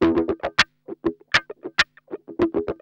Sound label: GUI, licks